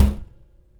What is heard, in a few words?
Metal Thunk; BB Retail Therapy Sample Pack